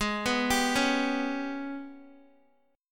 Ab Minor Major 7th Double Flat 5th, Guitar; arpeggiated; 4F,4A,5C,5G,5C,6E; JGuitar

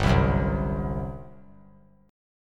G Major 7th Suspended 4th, G, down

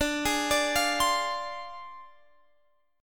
D Minor 7th Flat 5th; Dm7b5; arpeggiated

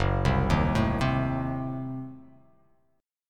F sharp Diminished 7th F sharp

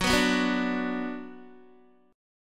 Gb Suspended 4th Sharp 5th, Guitar; strummed; JGuitar

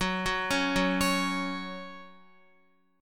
F sharp 5th F sharp down Guitar; arpeggiated; JGuitar

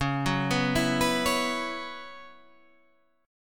Gb 7th Suspended 4th Db, chord

